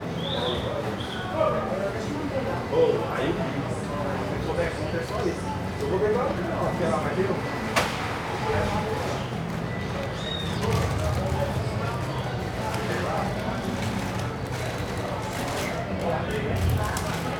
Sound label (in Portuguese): Ambiencia mercado goiania muitas pessoas, homens, pegando embalagem